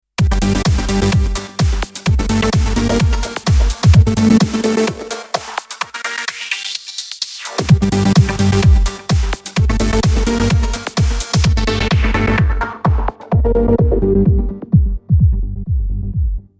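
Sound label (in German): Hörprobe djm 350 Filter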